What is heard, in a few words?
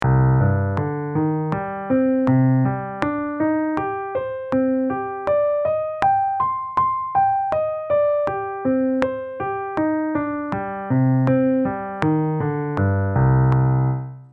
Slow n Fast